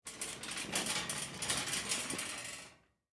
Shopping Cart Turn, Industry, Shopping, Cart, Turn; Stores and Malls; BlastWave